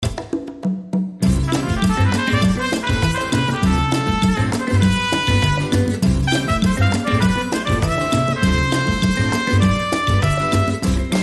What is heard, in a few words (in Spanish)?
Mi Trompeta Ti Canta